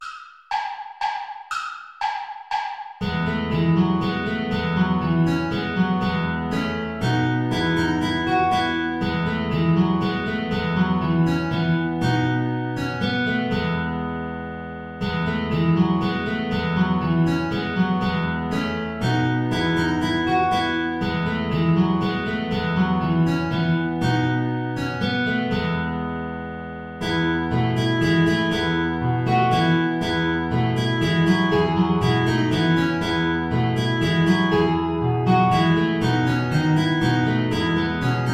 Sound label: saltarello play along without oboe; praetorius michael